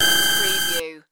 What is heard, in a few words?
digital data glitch